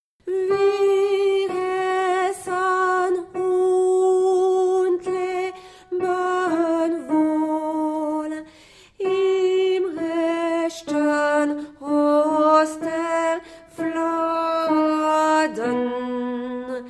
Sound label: Choral, Alto